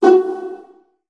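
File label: viola pizzicato F#1 right, Virtuoso Strings; ILIO; Kirk Hunter Virtuoso Strings VOL-2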